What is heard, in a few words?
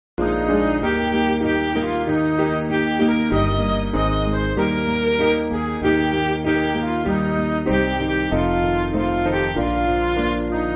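Midi, Music Backing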